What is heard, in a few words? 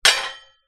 impact metal drain stone